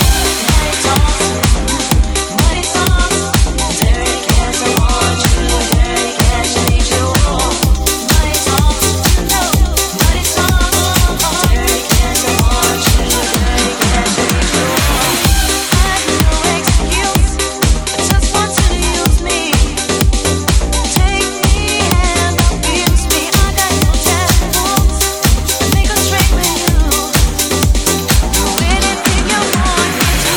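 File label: 126 bpm G minor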